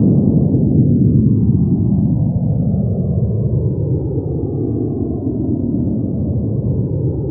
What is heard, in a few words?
CRUISING, WARHEADS; Spectrasonic Distorted Reality 1; WARHEADS 2